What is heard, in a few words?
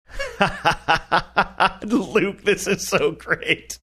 laughing saying this is so great